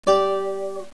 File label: kbd; Music Toys; kbot